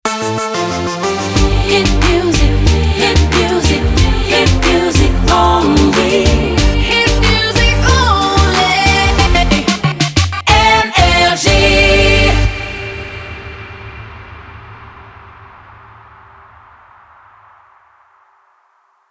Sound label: jingle; NRJ